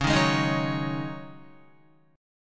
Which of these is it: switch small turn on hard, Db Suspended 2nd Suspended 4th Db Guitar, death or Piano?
Db Suspended 2nd Suspended 4th Db Guitar